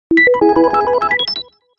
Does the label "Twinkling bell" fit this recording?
Yes